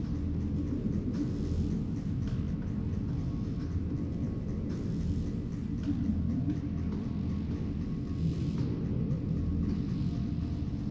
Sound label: ambience; Discovery on Kamino; Lego Star Wars (PC)